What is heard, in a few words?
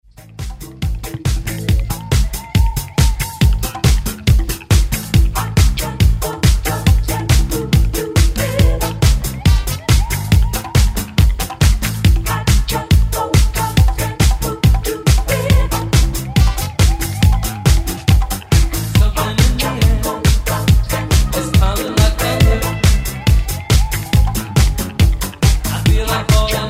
Hot Jungle Drums; 70s Disco Rebuild